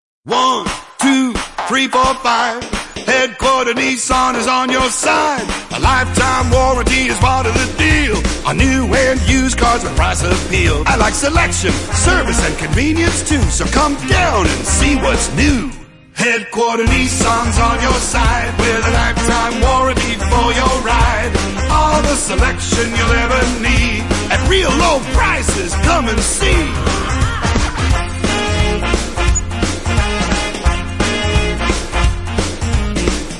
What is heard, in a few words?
jingles